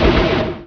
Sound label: shell; AC Demo